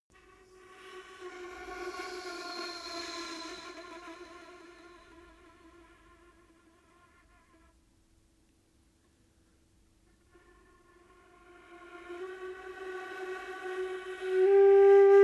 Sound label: TREE flute